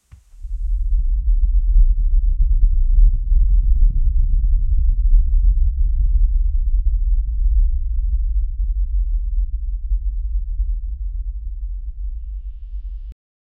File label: the sound of the earth